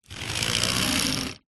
leisure toys lego vehicle small roll wood rubber tyres